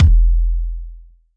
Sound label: kick late to the party sub; Kicks; single hits